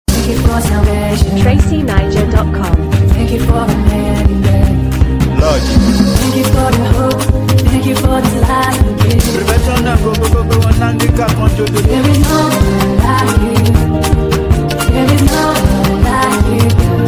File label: Beat